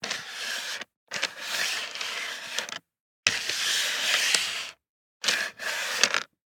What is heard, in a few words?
DICE on Plastic, Slide, Standard, 16 Sixteen Dice; Samples; ArticulatedSounds; DICE Sfx Party (Extended)